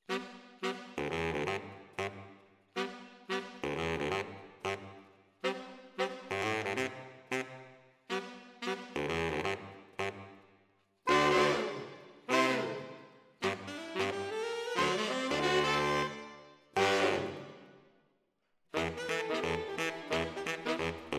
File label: ORTF SCHOEPS, piano